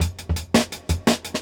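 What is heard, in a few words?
Jungle Breaks, 168 bpm; Original Jungle Breaks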